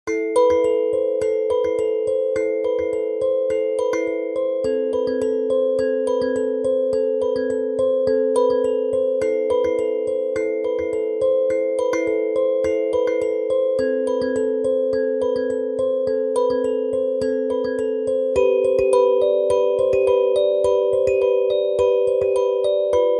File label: dark kalimba